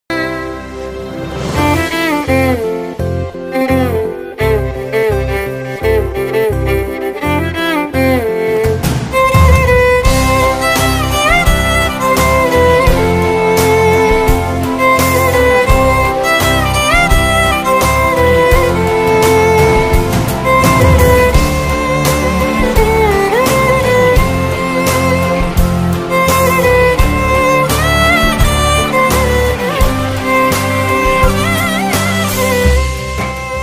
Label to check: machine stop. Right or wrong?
Wrong